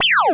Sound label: laser; lasers